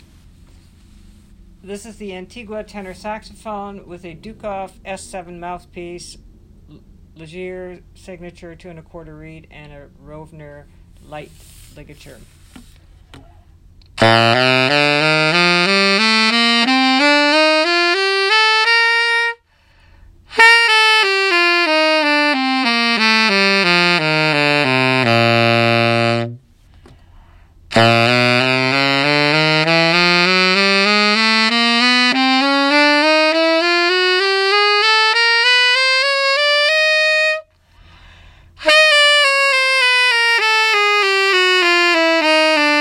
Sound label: Tenor, sax